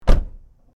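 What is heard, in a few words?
car door close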